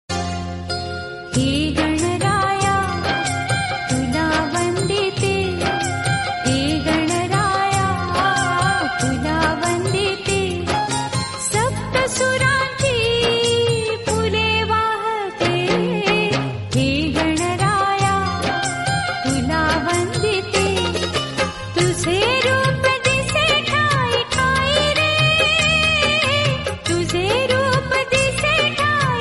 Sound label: ganpati devotional song, marathi